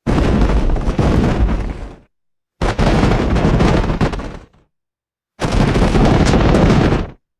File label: short bursts of cartoonish stampede thumps; animals